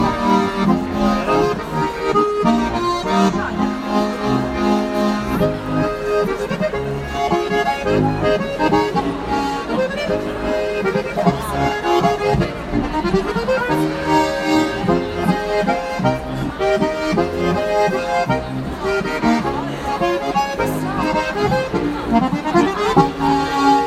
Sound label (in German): Ziehharmonika; Weihnachtsfeier